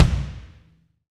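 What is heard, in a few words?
bass drum, Roland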